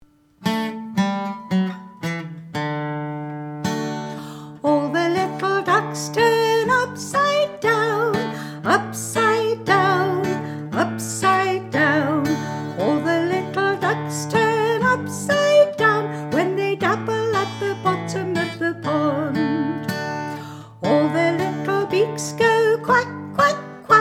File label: performance track; Dabbling ducks